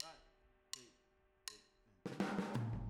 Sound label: Boogaloo - Room M, 82 BPM; Drums; Intro; Sonic Foundry; Drums from the Big Room The Mixes